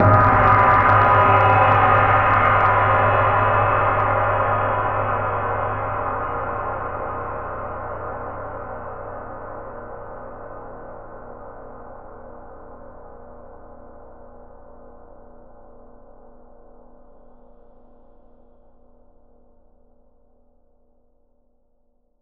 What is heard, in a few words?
Temple Gong; Sonic Foundry (Sony Creative Software); The Electronic Music Manuscript Collection